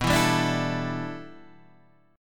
G 6th Add 9th B, chord

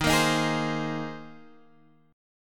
Bb 7th Suspended 2nd Suspended 4th Eb

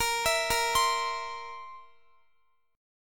A sharp Suspended 2nd Flat 5th A sharp, Guitar; A#sus2b5 arpeggiated

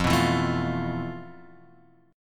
F Major 7th Flat 5th, chord; FM7b5 Chord {1 2 2 2 X 1} strummed

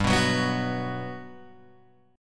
G Major 7th, G, down, Guitar, Open G

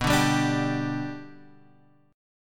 G Major B

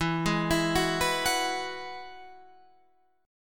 E Major Add 9th

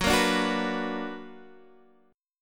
B Minor Major 7th

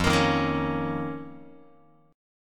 F 7th Suspended 4th Sharp 5th F down Guitar

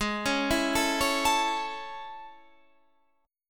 A Major Ab; arpeggiated

